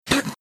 funny sound

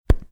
making mouth popping sound

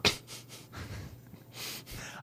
quiet nasal laugh

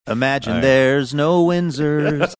singing

